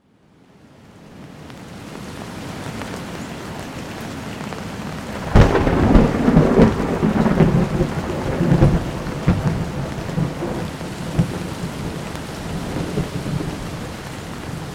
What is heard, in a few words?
Thunder Storm